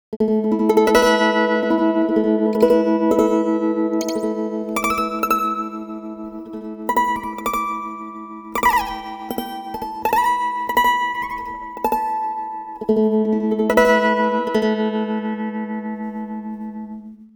pitchshift guitar, -12; i3 dsp quattro; i3 dsp quattro x

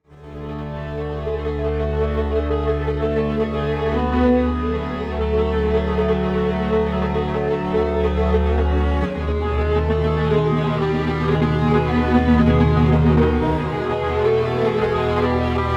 strings, timestretch; prosoniq time factory clear scale

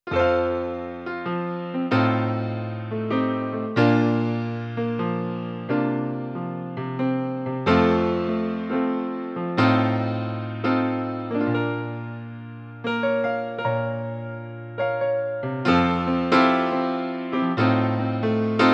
apiano sample, Clavia Nord Wave

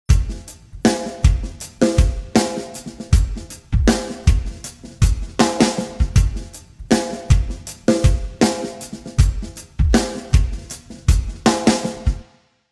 drums 133; timestretch; bias peak

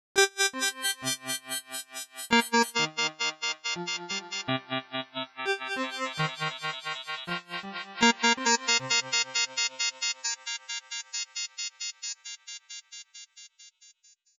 collision pad; ableton live8

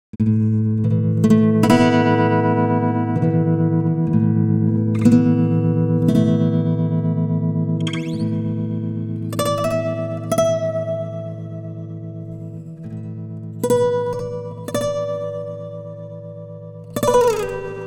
timestretch guitar; i3 dsp quattro x

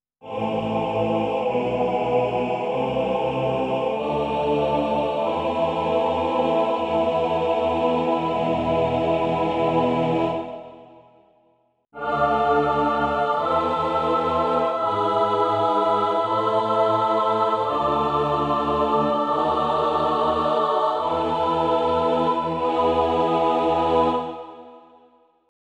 choir sample; Clavia Nord Wave

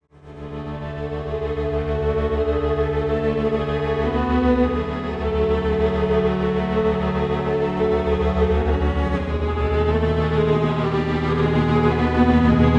strings, timestretch; i3 dsp quattro x

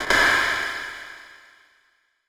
bright chamber; understanding reverb; anderton

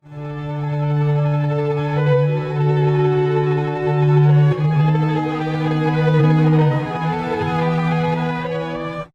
strings, pitchshift; prosoniq time factory clear scale

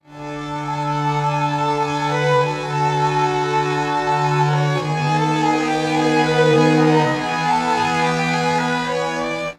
strings, pitchshift

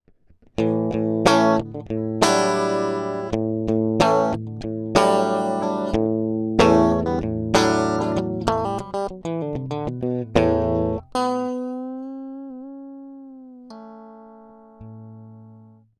solid-state alone

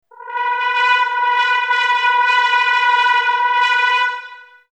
tromp; wallander instruments; wivi player

